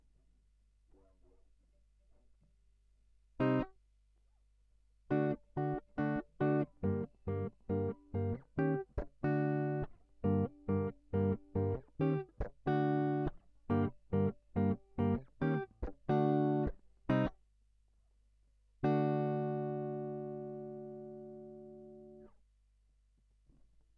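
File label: Guitar Line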